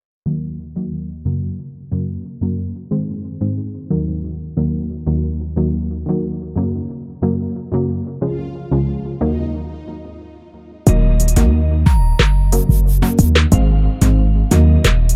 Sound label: Underwater Beat